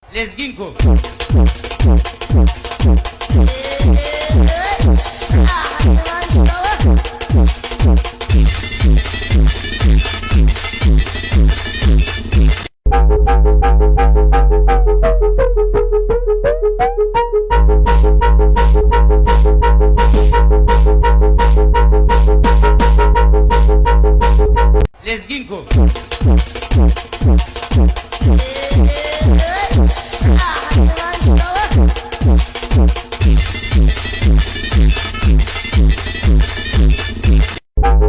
funk

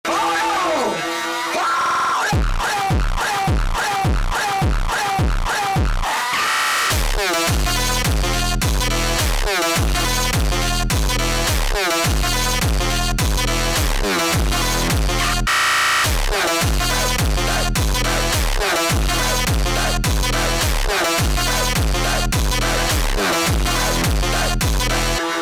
speed funk

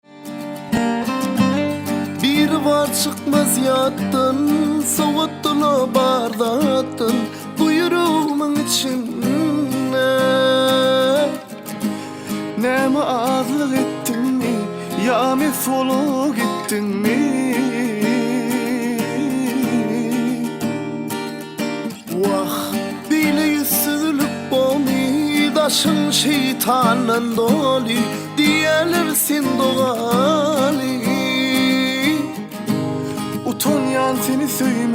guitar version

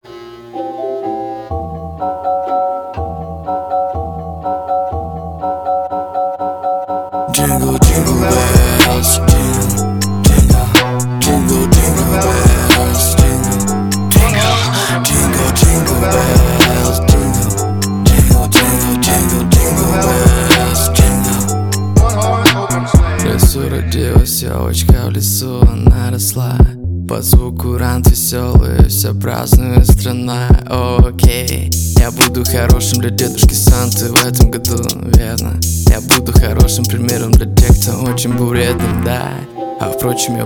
jingle bells

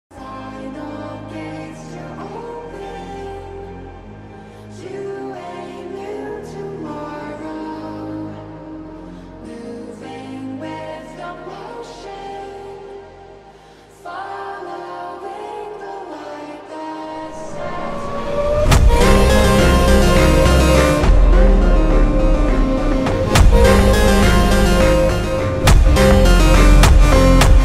funk slowed

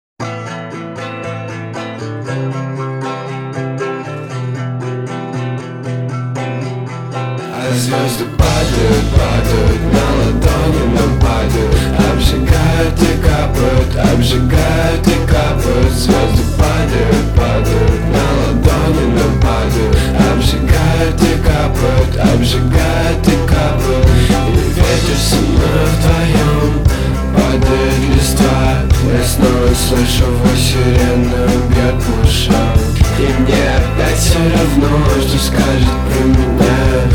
acoustic

